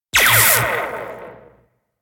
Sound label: Zzzap